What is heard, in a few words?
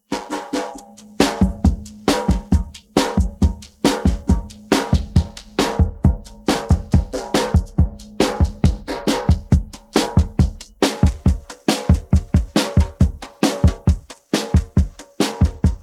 drums pattern